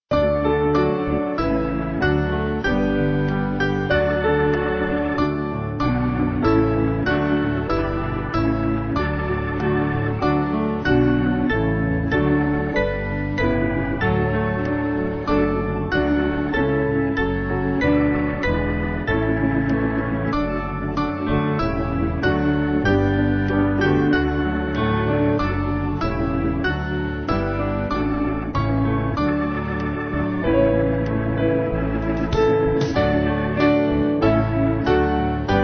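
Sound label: Band, Music Backing; Rock Of Ages; Redhead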